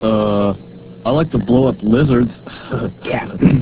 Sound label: blow burn